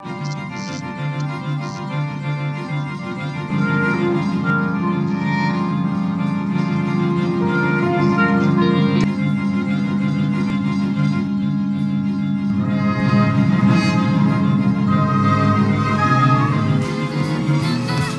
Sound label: music mash